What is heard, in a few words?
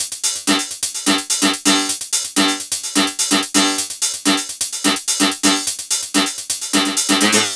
Subtractive Beat